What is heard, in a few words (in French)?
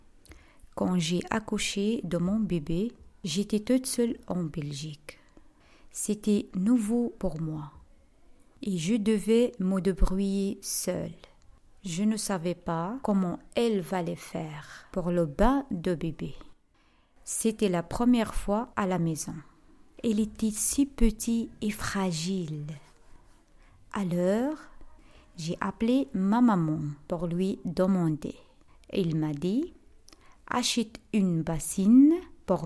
un rire pour etre heureux